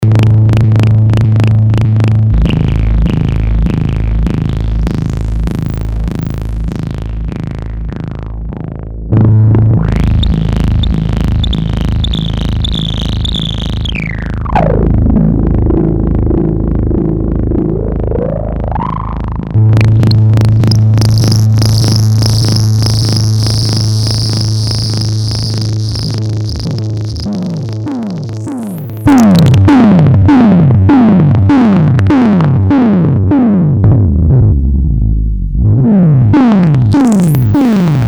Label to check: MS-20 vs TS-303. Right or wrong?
Right